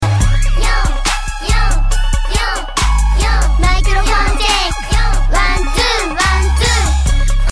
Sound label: applause